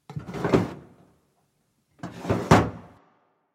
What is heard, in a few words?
drawer opening